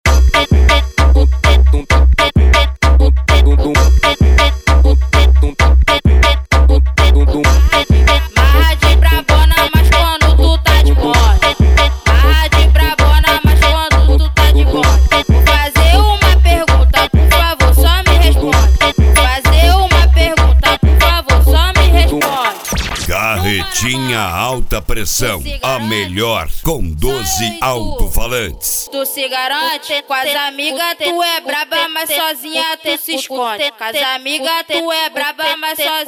ritimada agressiva

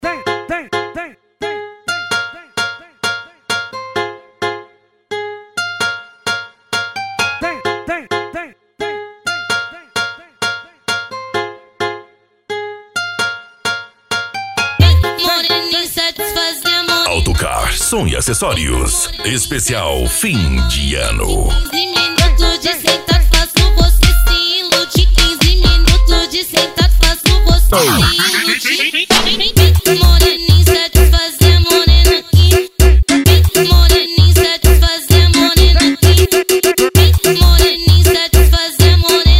funk agressivo ritmado e funkadao

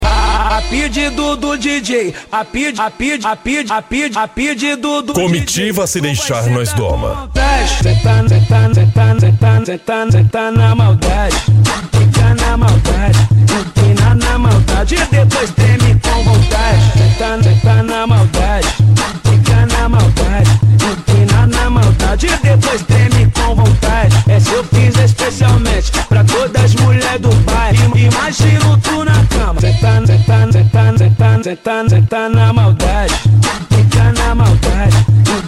funk bass

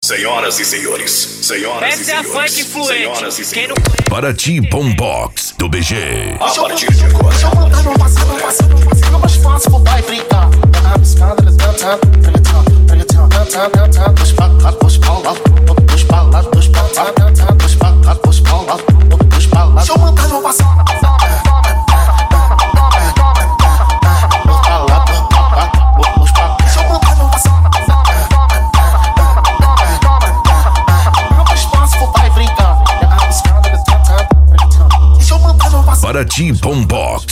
bombox funk

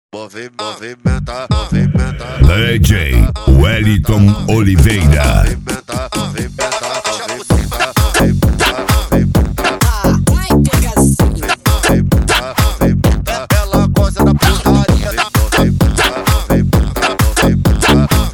funk tumdum e mega funk

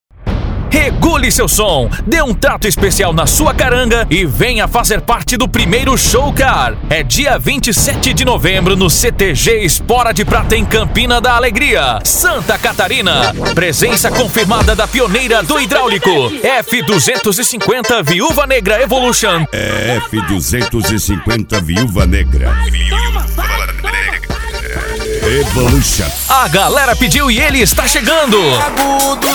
cd sertanejo; show car

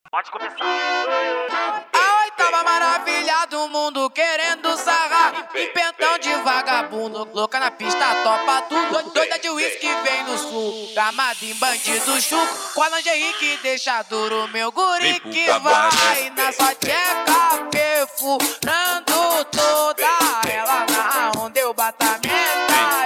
especial funk

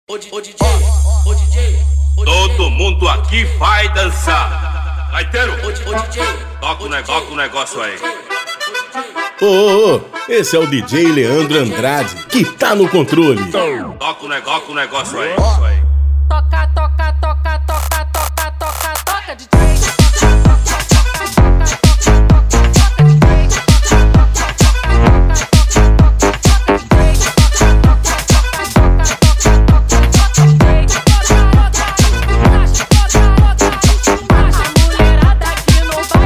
funk mega funk

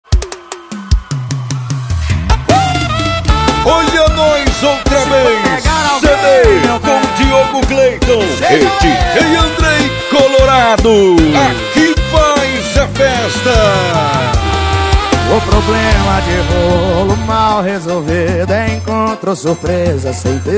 sertanejo com locutores de rodeio

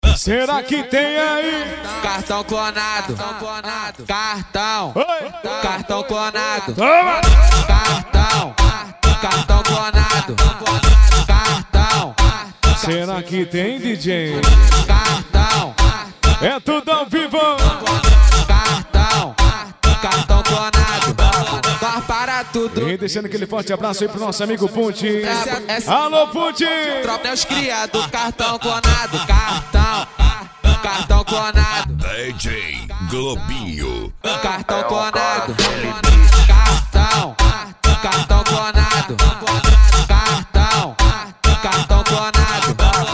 funk pisadinha sertanejo

